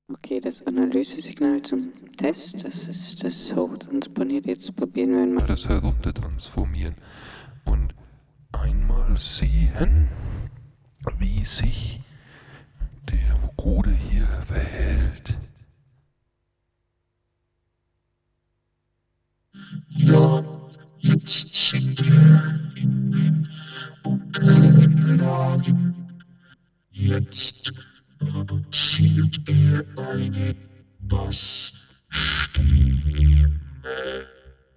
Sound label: vocoder